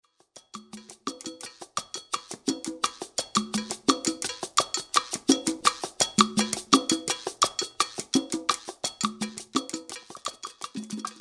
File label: Latin Beats